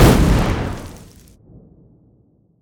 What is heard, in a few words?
small explosion; factorio 2.0.55